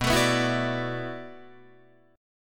B Minor 11th B down Guitar DADGAD; strummed

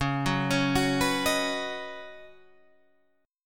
C sharp 9th Suspended 4th, down, Guitar Standard; arpeggiated; chord

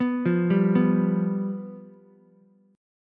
G 7th G down Ukulele; G7 arpeggiated; JGuitar

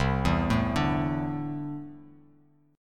C 6th Add 9th C down; arpeggiated; JGuitar

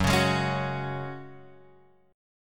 Gb Suspended 2nd, Guitar, Open F; Gbsus2 strummed; x,1,1,3,4,1; Gbsus2 chord

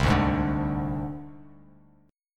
C Suspended 2nd Sharp 5th, Csus2#5 chord; strummed; JGuitar

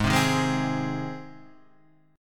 Ab Major, down; strummed; JGuitar

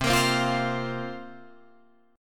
C 7th Suspended 4th, Guitar Drop D, chord

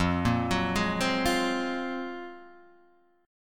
Gb Major Sharp 11th F

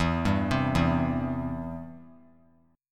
E Minor Sharp 5th, chord; Em#5 arpeggiated; JGuitar

